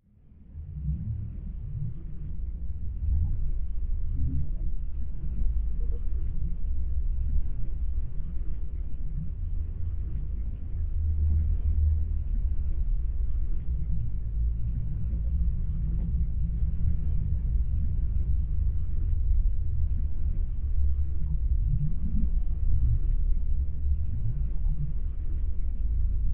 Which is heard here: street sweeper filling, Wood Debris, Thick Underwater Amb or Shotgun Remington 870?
Thick Underwater Amb